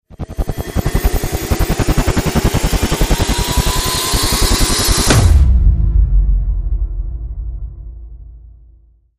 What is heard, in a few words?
Rise To Hit Enemy Approaching